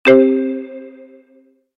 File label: notification; keezers